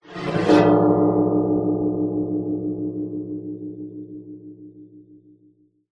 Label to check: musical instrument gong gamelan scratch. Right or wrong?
Right